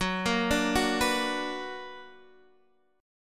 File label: B Minor Major 7th